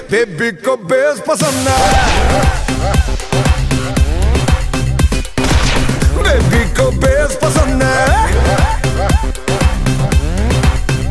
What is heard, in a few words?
BASS RINGTONE